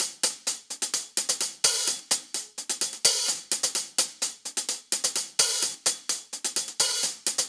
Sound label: Real Hihats, 128BPM; Vengeance Samples; VEH2 Loops